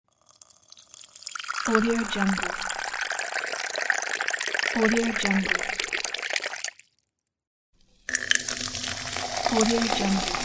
pouring liquid

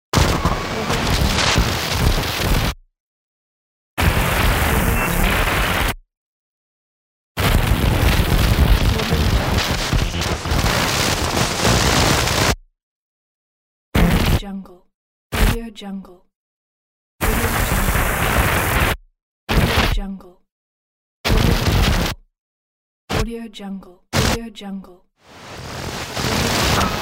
radio

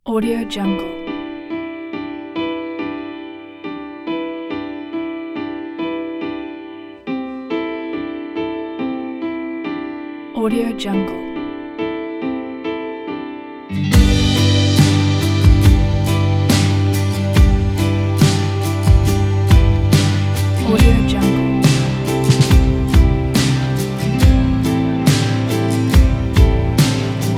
upbeat uplifting indie pop

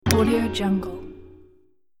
asian button

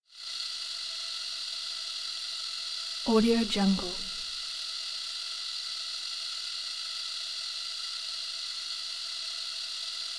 static hiss thin gassy constant

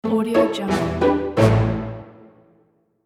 game over

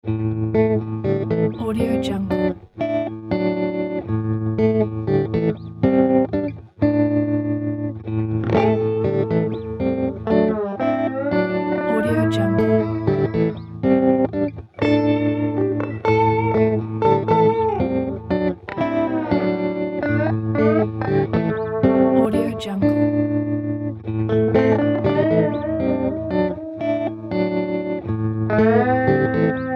texas electro guitar

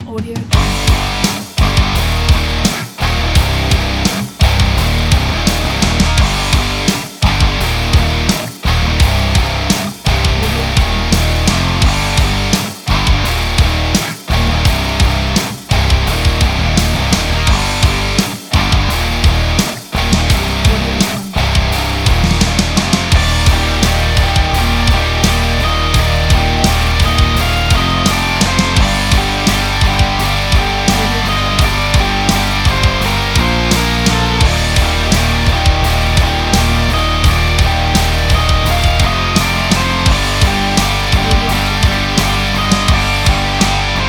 heavy rock

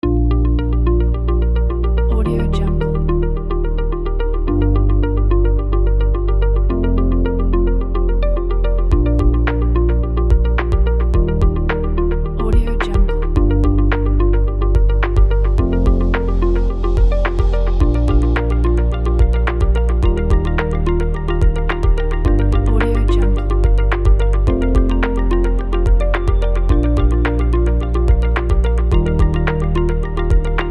tech; technology

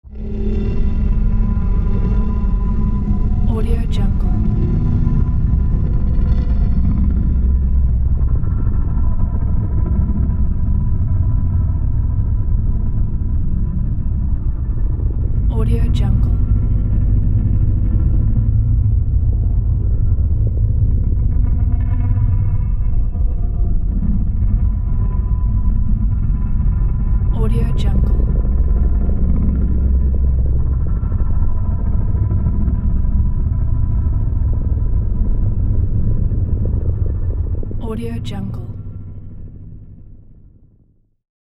nightmare ambience scifi

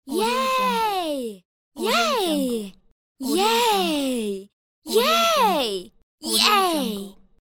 teenage girl yeah cheer

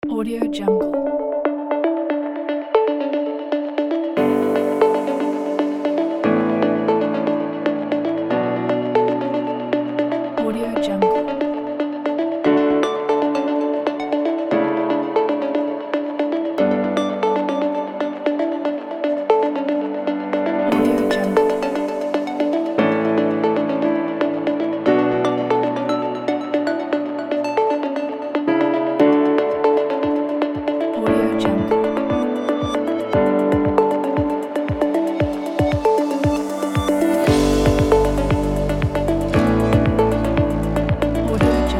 lush beautiful ambient presentation

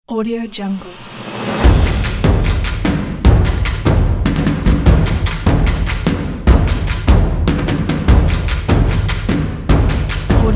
power drums

